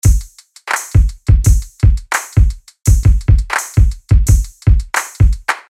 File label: beat